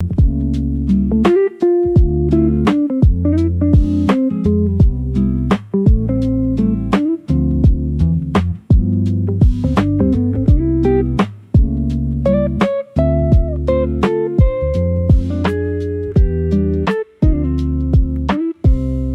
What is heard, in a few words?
loop